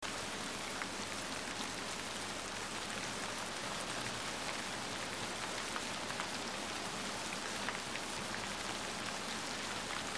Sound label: Sounds Of Nature Electrifying Thunderstorms; RAIN, THUNDERSTORMS; relax; Sleep Sounds of Nature